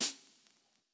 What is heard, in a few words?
Hihat Closed Dirty; One Shots; Drums